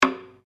Drum Taiko Shimea Rim, small Japanese Taiko drum; Musical; Miscellaneous Musical

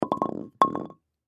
Wood Object Knock Over Statue; Knick Knack; Materials; Foley; Blastwave

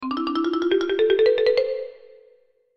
Marimba Major, Marimba Maj Ascend; Mallets; Blastwave

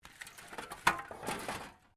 METAL SCRAPS MOVE; Metal (Sound Effects:Materials)

